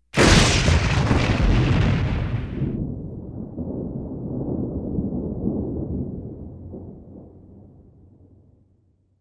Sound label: thunder; Sourceforts2.0; rainthunder